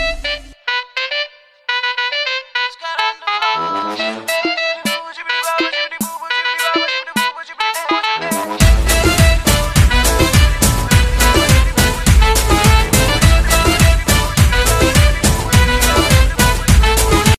soft romantic ringtone, bollywood

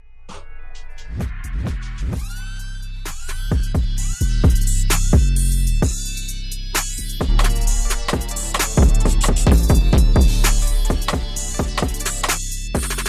nokia ringtone

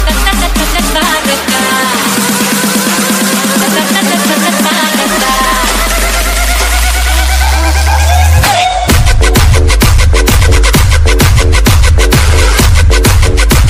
trance dj ringtone

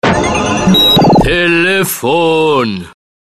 hello phone musical call tone ringtone

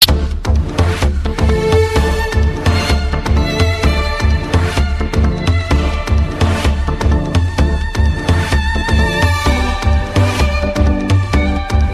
amazing violin ringtone

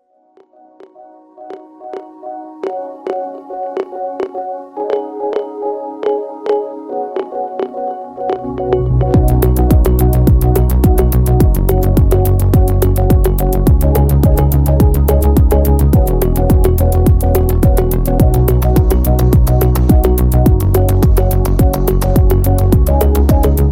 electromix ringtone

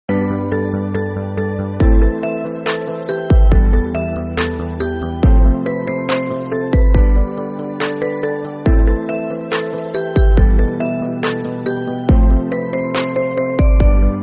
lovely romantic background music tone ringtone

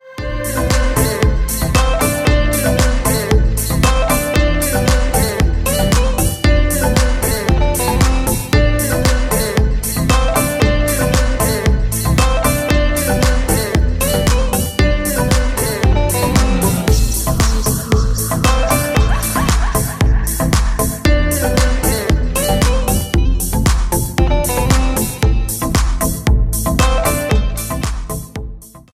ringtone, cool ringtones; eliptical ringtone

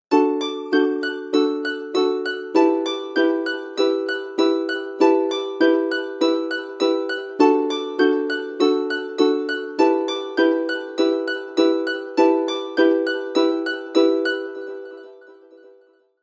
charming ringtone, apple

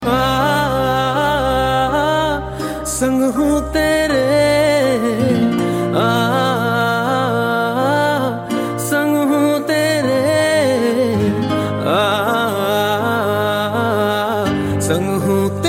sweet love call tone; bollywood

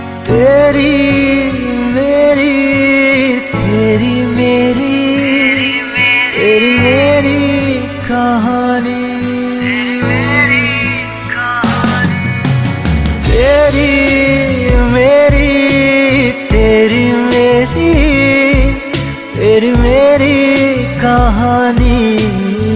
bollywood ringtone

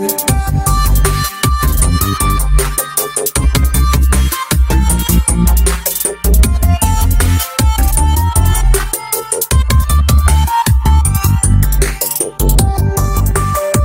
bollywood music ringtone

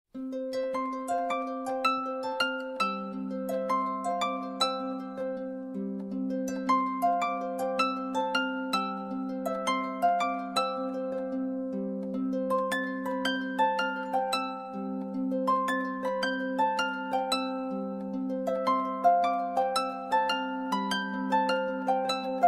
official samsung galaxy note 10 ringtone

